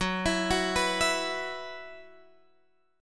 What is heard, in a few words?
F sharp 7th Suspended 4th, Guitar, Open G